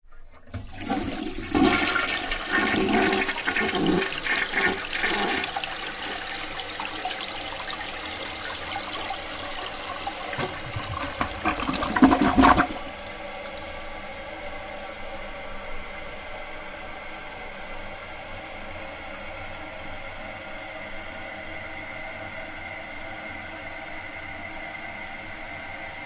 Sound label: Ambiance Water